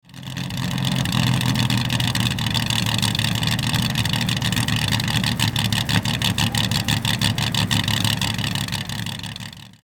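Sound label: AC Ace III Historic Special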